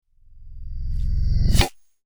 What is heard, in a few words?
Science Fiction Magnet Attract Super Power; Electrical; Sound Design